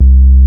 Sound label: SINE C2, Hip Hop; E-MU Formula 4000 Series Vol. 1; Hip Hop Nation